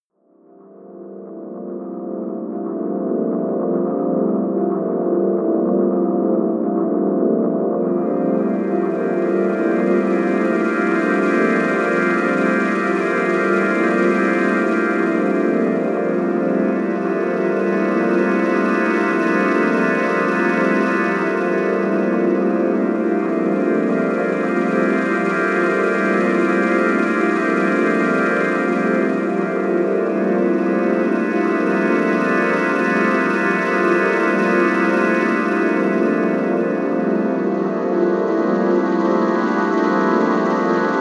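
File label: sleet, music elements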